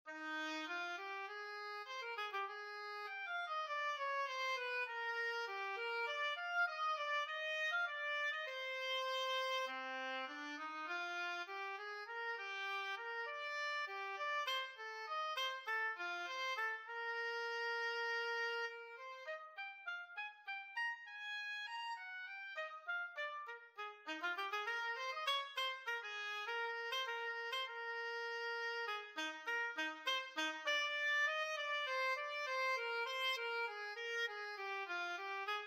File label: Oboe; Pensacola Civic Band WW Auditions